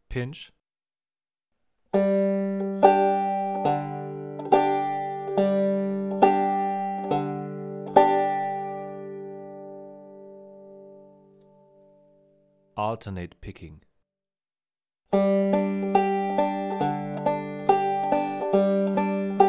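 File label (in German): thumb lead figuren mit wechselbass, banjo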